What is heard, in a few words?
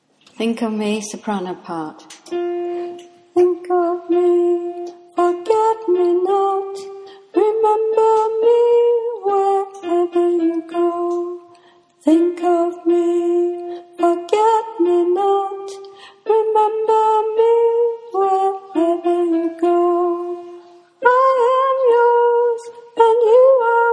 soprano